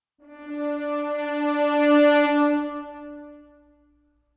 Combat Out; The Witcher 3 Wild Hunt; Skellige

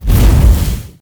Fire Ball Hit; god awe full clicker 2018 gamerip

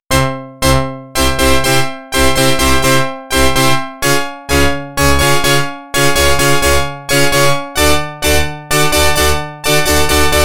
Organ; NHL 94

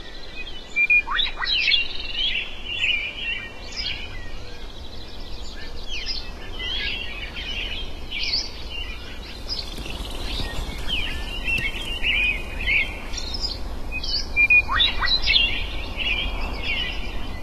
El Dorado Ambience

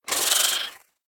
Spider Aggro; Devour; The Inn

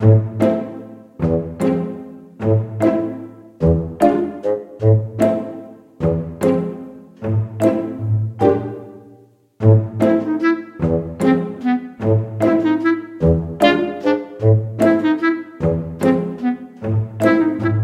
Cutscene BGM; Cookie Run Kingdom; Trio

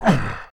pain; tyril; Idle Champions of the Forgotten Realms

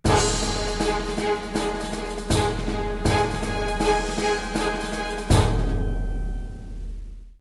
battle won; Dominion; RuneScape 3

